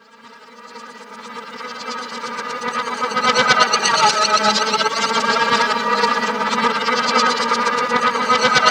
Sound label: irritant, bizarre guitar; Spectrasonics